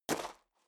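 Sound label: step high heels gravel medium single right, footsteps; schritte; menschen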